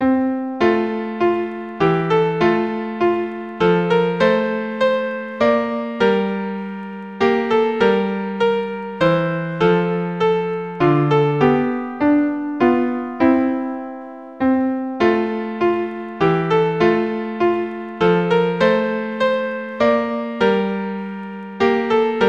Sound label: Away in A Manger, keyboard